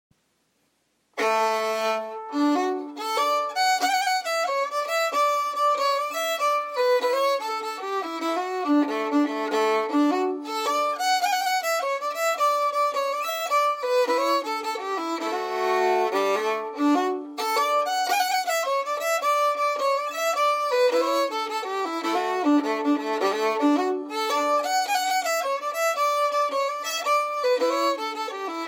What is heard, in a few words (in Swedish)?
stamma; ossian